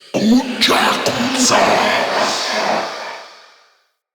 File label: Male Vocal Charge Scream; Enemy; Mockery; Mockeries